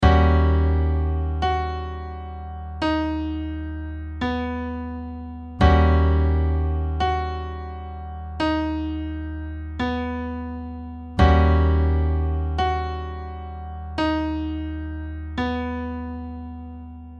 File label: B Triad Over Eb7